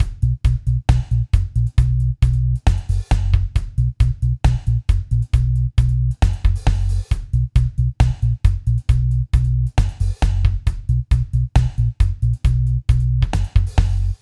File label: beat, reggae loops